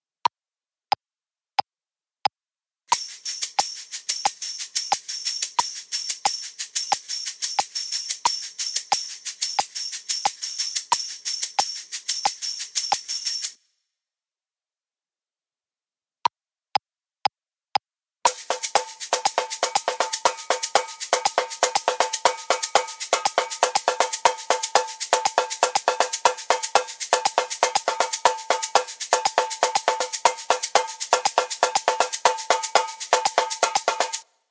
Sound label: Shaker Tambourine